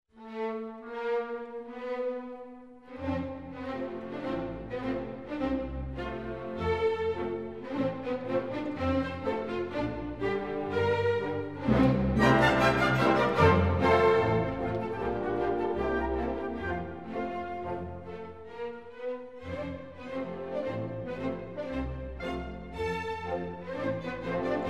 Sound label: A-Dur in A Major Allegretto; Ungarisches Festival